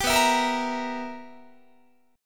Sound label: B Major 7th Suspended 4th Sharp 5th Guitar, chord; strummed